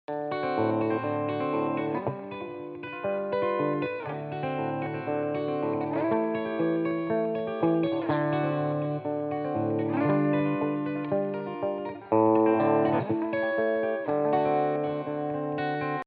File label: Pedal Steel Backing